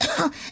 coughing; toadette; SM64 CO-OP

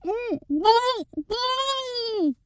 drowning; pauline; SM64 CO-OP

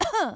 coughing; pauline; SM64 CO-OP

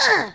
uh; toadette; SM64 CO-OP

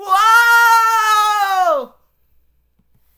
fall; SM64 CO-OP; Junio Sonic

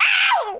attacked; birdo; SM64 CO-OP

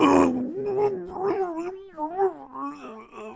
drowning; foreman spike; SM64 CO-OP

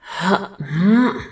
hrmm; rosalina; SM64 CO-OP

